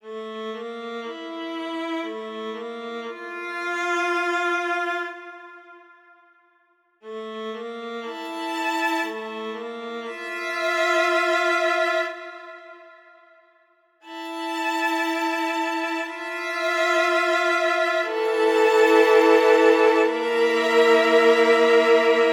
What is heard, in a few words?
Discouraged, violin medly